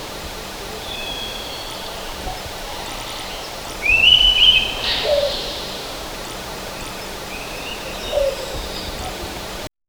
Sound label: early morning forest ambi